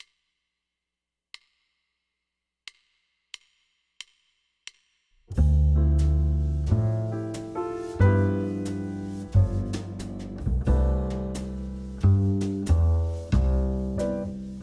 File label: Major 2 5 1s in 12 keys swing